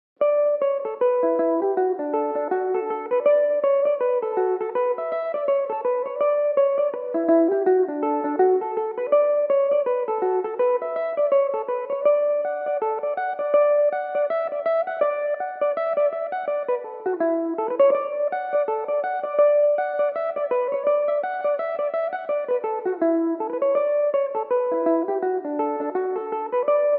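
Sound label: D major belmuse, irish mandolin; The Old Pensioner; (4/4); Belmuse EM-190 electric mandolin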